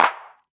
clap sample g sharp key, Loudest frequency: 1267Hz; single hits one shots